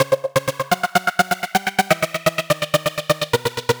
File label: synth arp; Electrocution